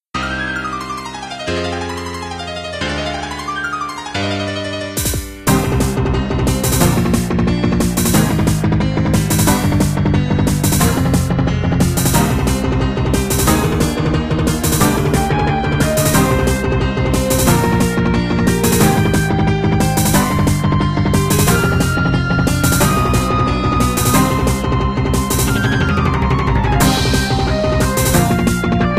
chorale for war; homestuck

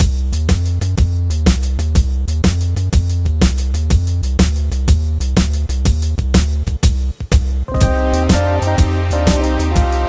deep blues; crypt of the necrodancer

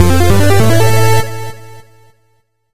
powerup

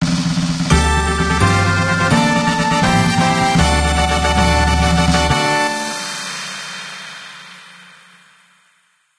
fanfare; sea of stars ost; solstice

